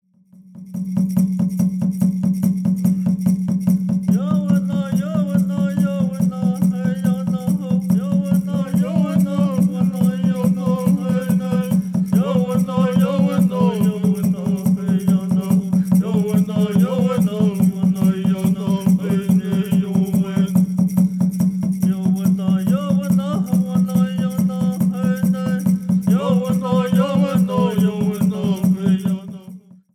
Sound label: Peyote Song